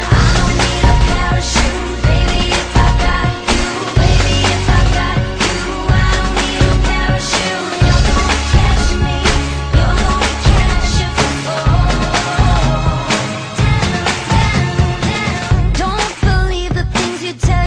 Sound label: english song tone ringtone; english ringtones